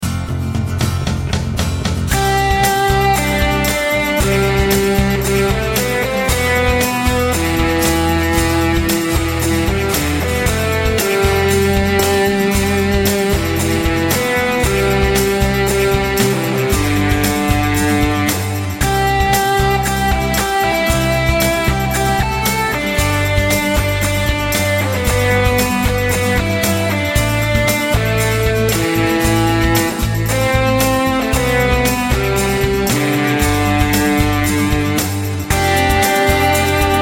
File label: acoustic rock mix